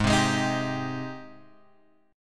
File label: Ab Major 7th Ab, Guitar Open G; down